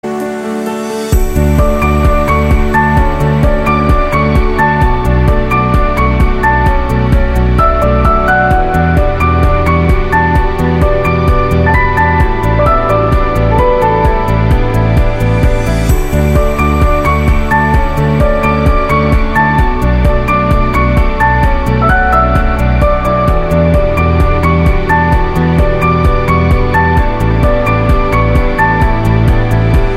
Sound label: Beautiful Optimistic, ringtone